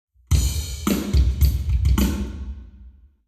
Hall, Drums 0deg; eBreve

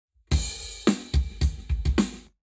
Lab Wet Drums 0deg

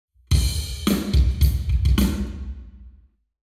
Hall Drums 0deg; eMagLS

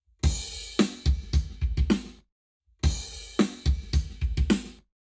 Lab Wet Drums 0to360deg; eMagLS

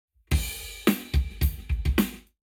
Lab Wet, Drums 0deg; eMagLS

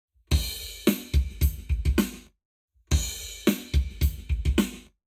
Drums, 0to360deg; eBreve; Lab Wet

